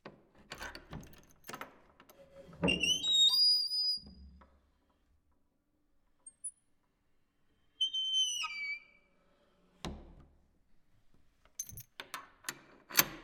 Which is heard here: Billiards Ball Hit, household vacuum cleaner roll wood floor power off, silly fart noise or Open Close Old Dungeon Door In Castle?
Open Close Old Dungeon Door In Castle